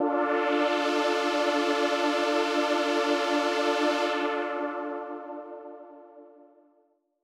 Dramatic D4; KG MegaSaur

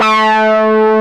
P600 WAH TRNS; Rubber Chicken Software Sample Bank

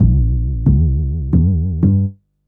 Upright Synth Bass, Drum & Bass; Fluid Dynamics

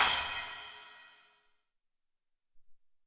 Splash, Alesis SR-18

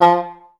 STAC F2, Orchestra; Best Service; Peter Siedlaczek's Advanced Orchestra

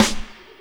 SD, dance drums hip

